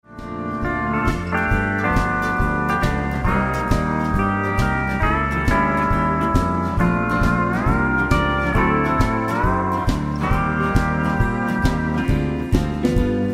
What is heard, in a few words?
slow boat